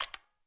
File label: minimap on